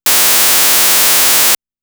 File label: hpf Resp B